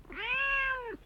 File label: Cat meow